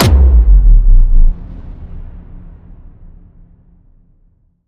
Hit Low Knock Out

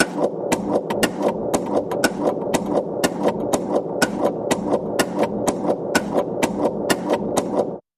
Tick Slow

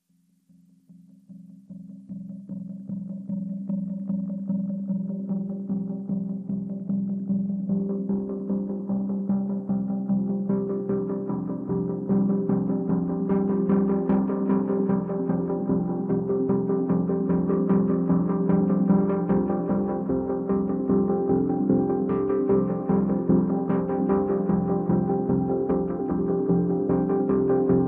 Pulse Only Mix

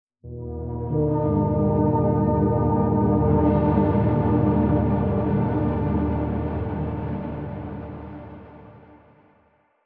100Hz

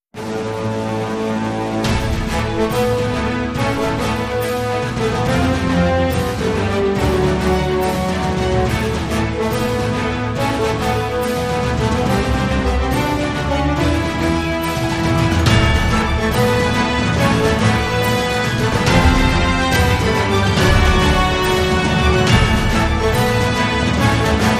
Champions Anthem

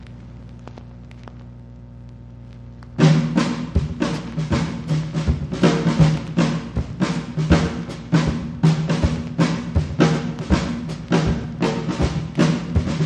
Instrumental

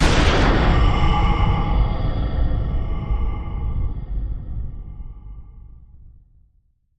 Hollow Boomer Impact

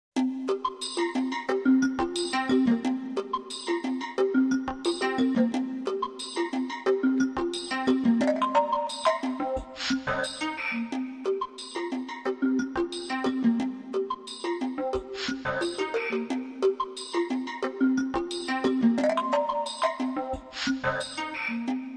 Rhythm

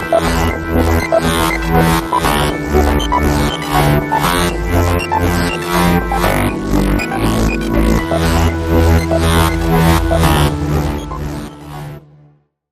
Link Sting

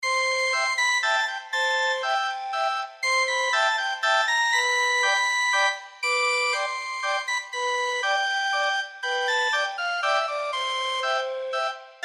Calliope Waltz

